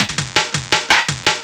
Beats, Synchro Funk

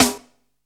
TUNED ROCK, SNARES; Zero-G; Funky Elements

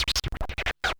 SCRATCHES; Scratch 1

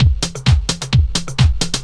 groove, DRUMLOOPS

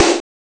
x s drum; ST-XX Amiga Soundtracker Sample Packs

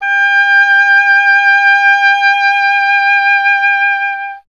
oboe; Miroslav Vitous - Solo Instruments VOL-2

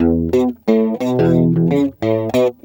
GUI; Best Service Gigapack 2

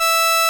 SOLINA, String Machines

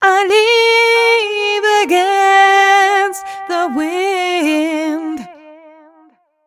f mel c, fem Vocals

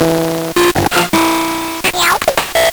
Nintendo Gameboy LSDJ

